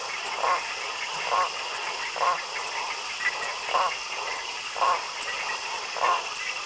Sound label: Frogs